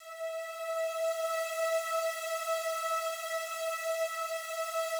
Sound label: slow strings E4, Yamaha VP-1; 90 s Sample CDs; Music Store